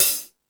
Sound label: High Hat, E-MU Pro-Cussion